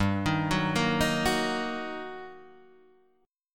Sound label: G Minor Major 7th Sharp 5th, down, Guitar, Open D